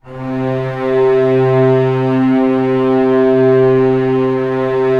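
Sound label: STR C2 FF, Orchestra Strings; Sound On Sound - Peter Siedleczek's Orchestra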